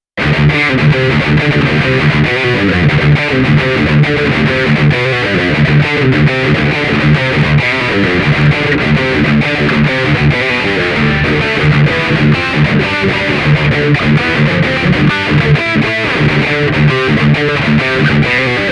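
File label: Metal Riff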